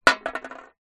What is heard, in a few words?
drink can empty down on concrete ground